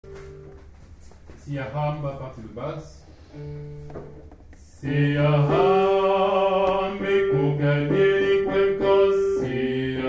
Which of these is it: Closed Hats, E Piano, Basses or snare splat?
Basses